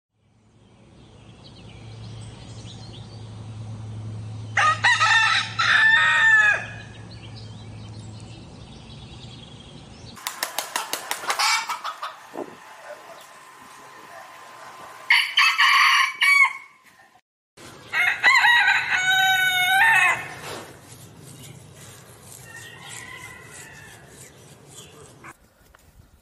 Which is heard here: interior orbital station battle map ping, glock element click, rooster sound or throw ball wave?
rooster sound